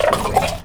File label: DOG; 3D Sound - 3D Keys VOL-1; MEAN 3D